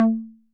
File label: Conga Low 808 Sat